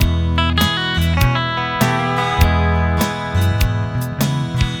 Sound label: Country, ringtones; Google; NewWave Labs